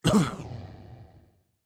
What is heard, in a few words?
Cough; Going Under